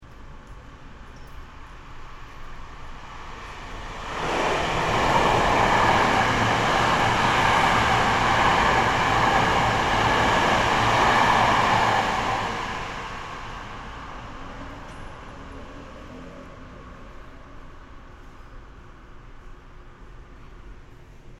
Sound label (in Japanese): 駅のホームで電車が通過する環境音